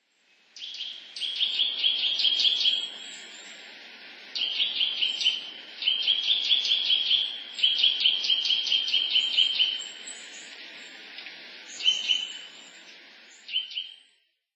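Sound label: Eurasian Nuthatch, nasushio; koe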